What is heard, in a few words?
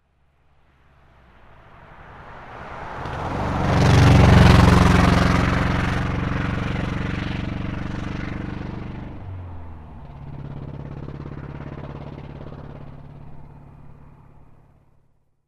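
car passing by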